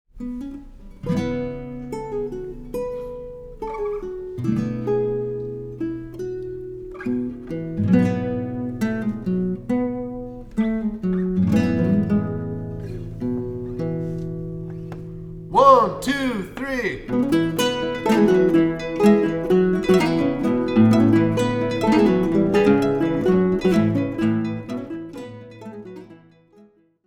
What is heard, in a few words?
Guitar Quartet